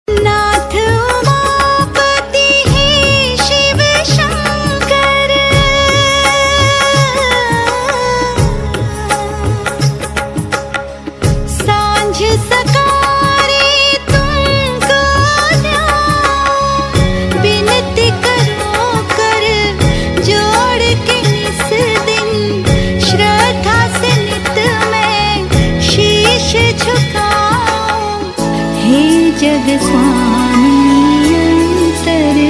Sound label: Shiv Bhajan; Siya Ke Ram